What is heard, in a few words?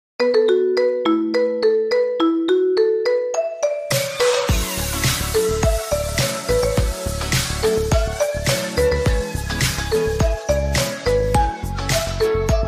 Marimba Ringtone